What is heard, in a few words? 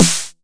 909 SD; Goldbaby Tape808 & Tape909; The Tape 909